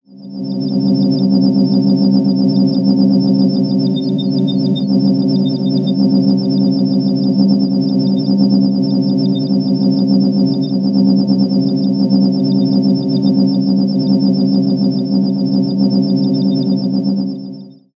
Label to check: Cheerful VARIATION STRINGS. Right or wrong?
Wrong